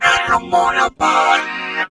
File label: TALKBOX